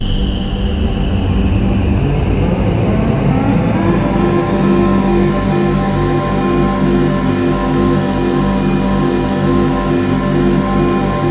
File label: ZOOM; Optical Media International; Sonic Images Library; SI FX Vol 11